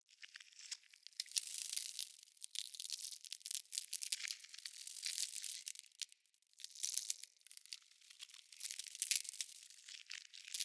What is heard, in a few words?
Corrupted Critter Loop, Ambience